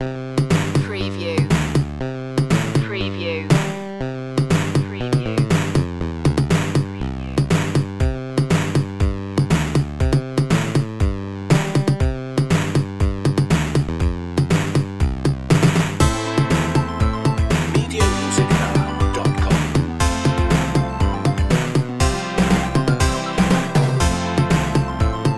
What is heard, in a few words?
silly gameboy underscore; novelty